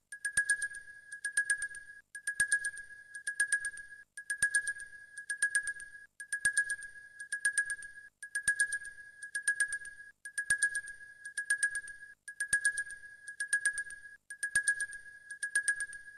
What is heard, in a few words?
load